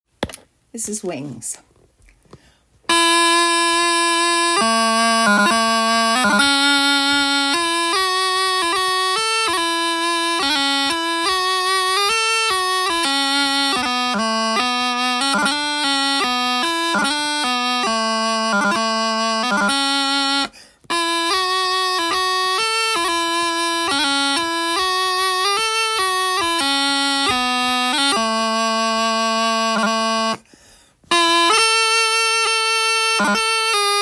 Wings, pipers

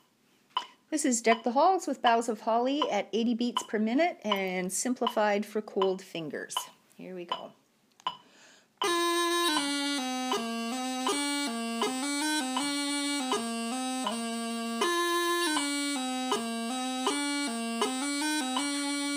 Deck The Halls, pipers

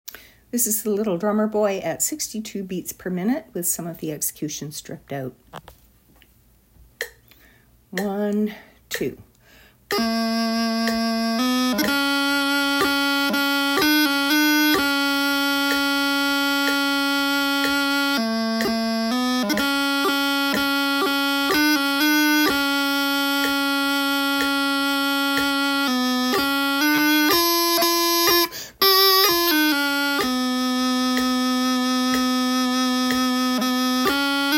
62 BPM, pipers

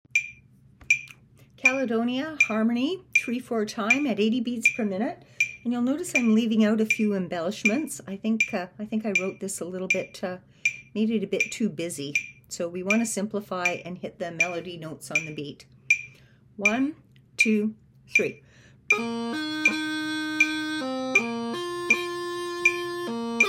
Harmony, pipers

